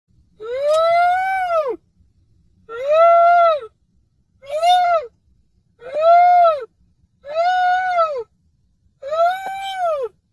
cris de renard

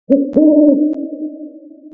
chouette

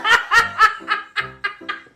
rire

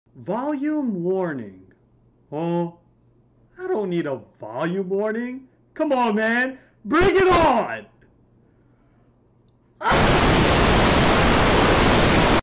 cri

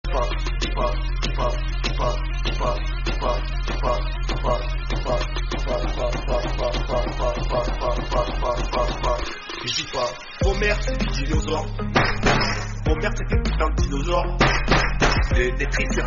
musique

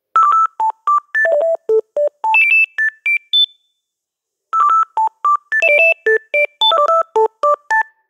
sonnerie

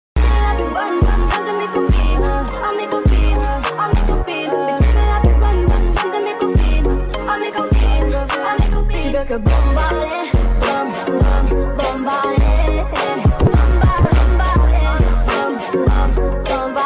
trap remix

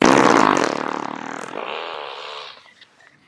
pet gluant

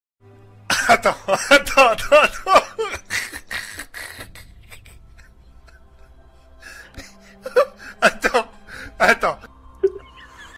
rire

